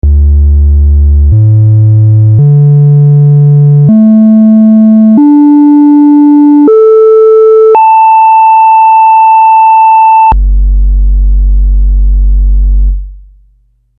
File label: 261e Sine, Buchla; 259 vs 261e audio comparisons